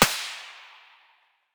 svu muzzle mono far, weapons

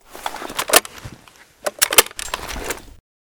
reload, weapons ash12; STALKER; IWP

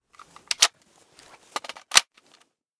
ump45 reload, weapons

